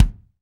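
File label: bass drum, Roland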